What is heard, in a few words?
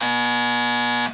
BUZZER; artparts